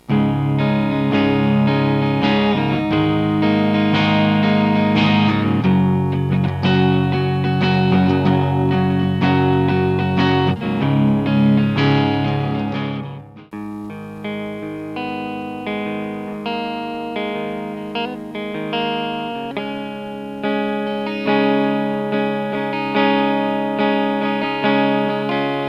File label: spider II 30 clean